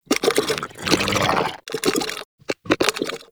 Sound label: Shrimp Idle; Creatures; Vocals; Baliq Shrimp Glade